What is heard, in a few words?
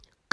Headphone; Cascos